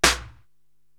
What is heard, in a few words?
SD Circle, Korg TR-Rack drum machine